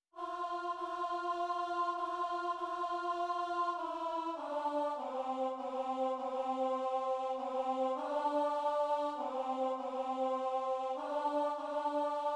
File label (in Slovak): alt, zborove skladby; vianocne